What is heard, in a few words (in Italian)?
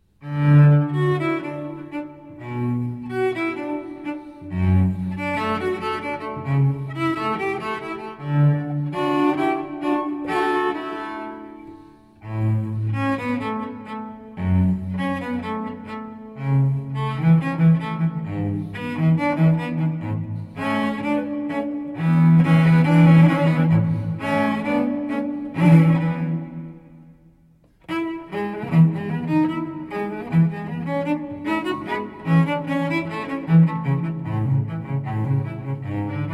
Andante, Sonata per cello solo in re magg; TWV 40,1; GP Telemann